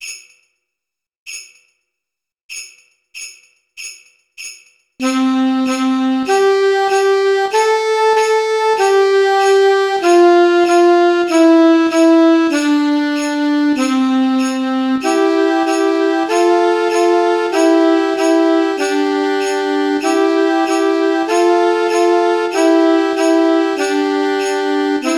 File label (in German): Morgen kommt der Weihnachtsmann Sax Play